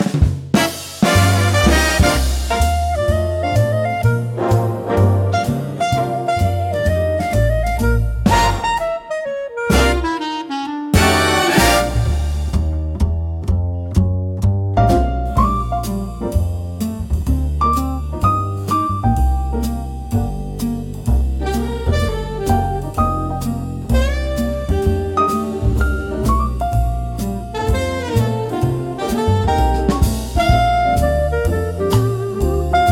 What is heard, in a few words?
Jazz